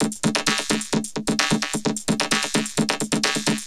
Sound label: Vocobeat; Sonic Foundry (Sony Creative Software)